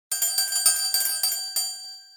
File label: bell